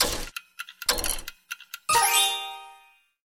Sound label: Time Powerup